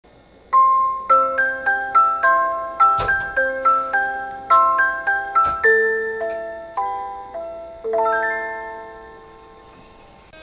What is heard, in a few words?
chime; odakyudentetsu